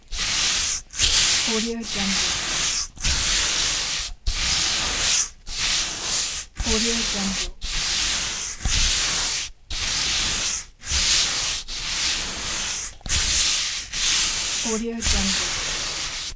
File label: brushing slow